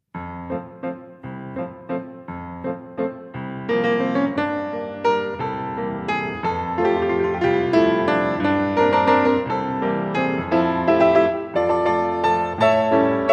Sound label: Fouetté Ronds De Jambe En Tournant, ballet; adv 1 ballet